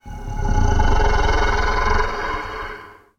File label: horror monster ogre growl